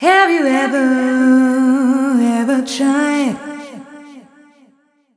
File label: f mel g, fem Vocals